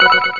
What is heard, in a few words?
click